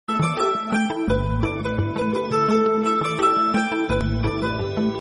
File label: instrumental music tone ringtone, bollywood ringtones